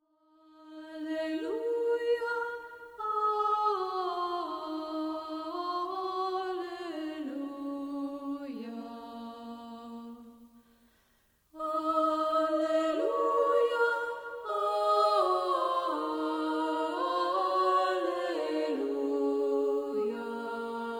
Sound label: Medieval Alleluia, sacred songs; cantiones sacrae